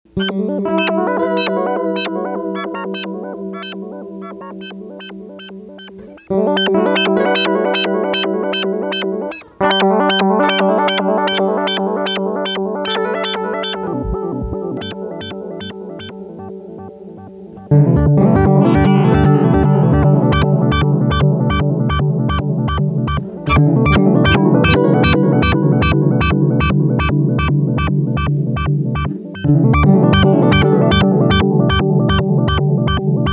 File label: decade seq, CD4017 decade sequencer